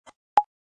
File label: new touch; Cute Monster Jump